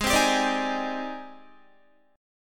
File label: Ab Major Sharp 11th Ab down Guitar; AbM#11 strummed